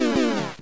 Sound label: Ill-Tempered Program Error